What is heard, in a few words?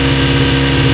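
Engines low loop; SuperBike2001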